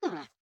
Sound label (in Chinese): 犰狳环境声